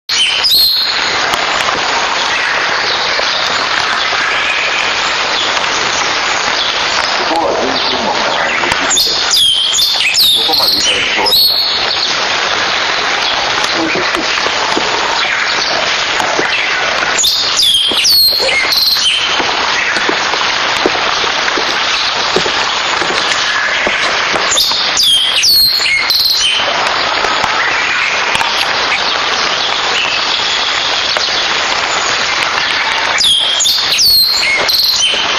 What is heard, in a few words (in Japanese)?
コサメビタキ; 戸隠